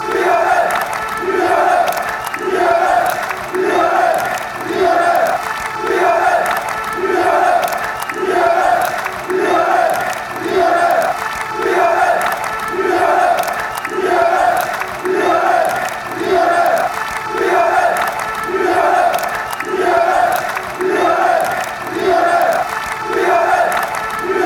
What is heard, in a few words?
fan chants; lyon